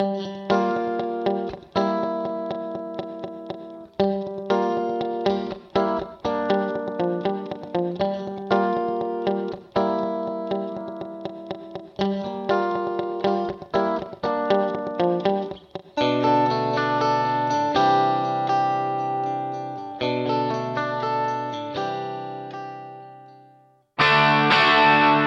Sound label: Guitar Yamaha Rev7 Guitar; Vintage Digital